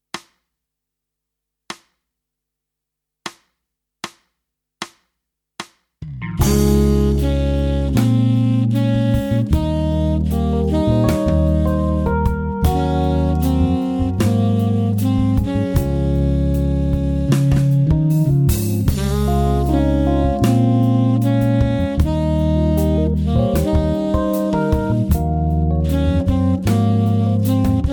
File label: Tenor; Saxophon; sanften Liebesballaden